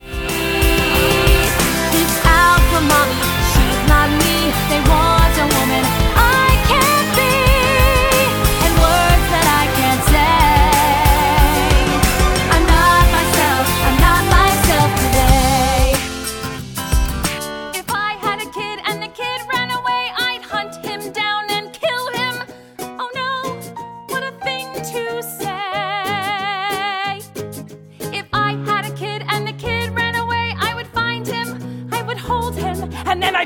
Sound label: Vocals